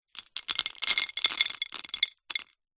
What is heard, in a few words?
food savory snack wasabi peas in ceramic bowl handful drop in; zapsplat